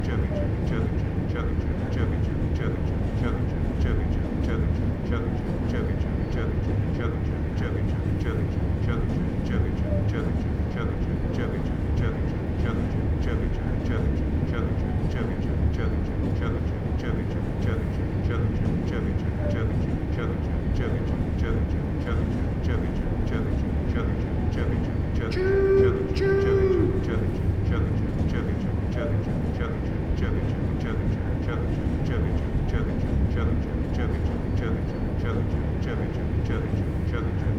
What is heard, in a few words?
ambiance train